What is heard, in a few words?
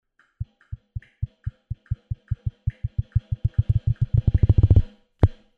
Drum Enhancer Cond Mic Tap Dry